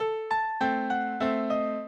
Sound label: minuet, mozart